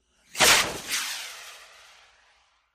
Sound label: Solid Fuel Rocket: Launch And By. Stereo; small rockets